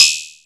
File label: Open HiHat, Nord Modular Drum Samples; Yawgmoth